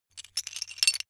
metal pieces clatter short

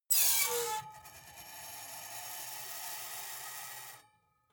metal screech phaser fast

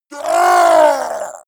goblin scream pain; schreie

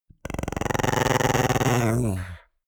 chihuahua growl creak

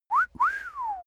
male wolf-whistle; soundpool

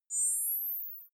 night-vision activate

